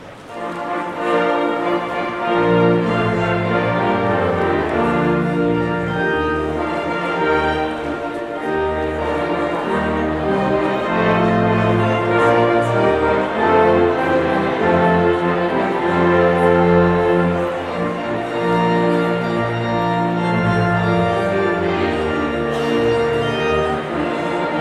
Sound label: Postlude